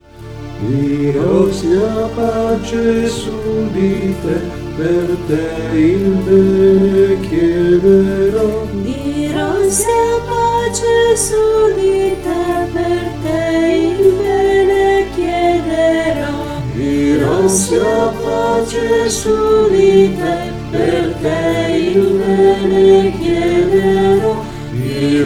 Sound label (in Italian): Canto